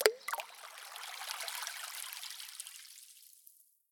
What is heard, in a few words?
droplet